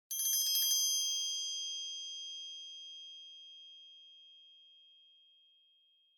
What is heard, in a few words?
Triangle Roll Long